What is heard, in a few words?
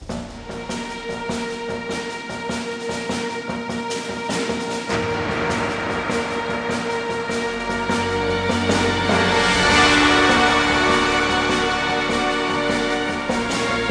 Sicily Action